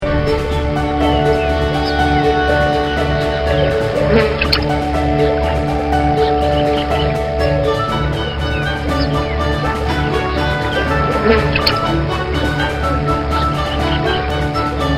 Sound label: Tree Vale Music; Super Adventure Pals